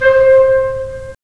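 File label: wind clarinet; LA Composer vol. 3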